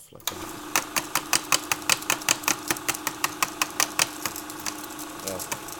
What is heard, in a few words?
Film flopping